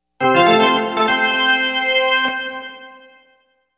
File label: Pipe Organ Baseball